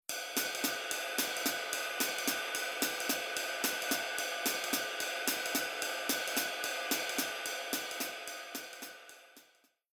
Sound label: Jazz Waltz Rhythm Basic